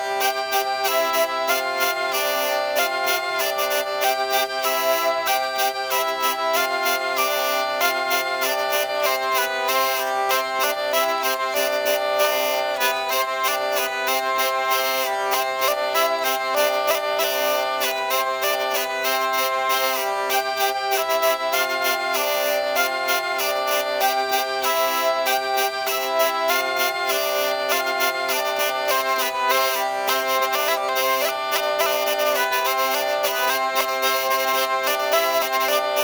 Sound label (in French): polka; pere machin